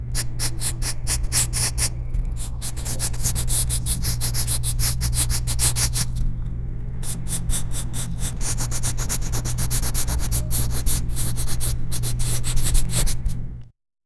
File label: sketching sound, drawing